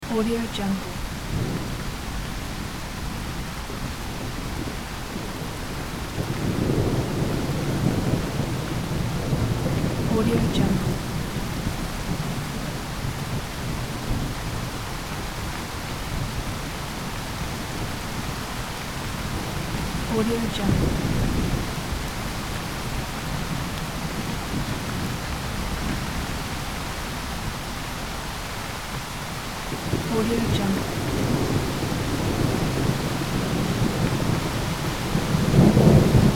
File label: wind rain and rumbling thunderstorm loop; nature sounds; باد و باران و رعد و برق